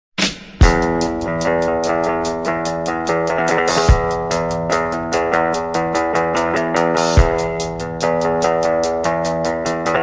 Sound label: guitar trio